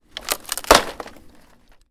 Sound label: Snapping Wooden Fence